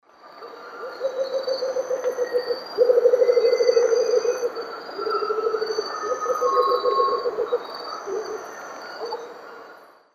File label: Momotus momota, roll duet; S. America; Eastern Lowlands